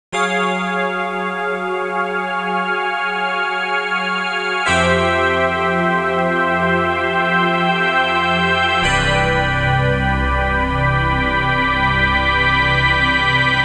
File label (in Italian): musica newage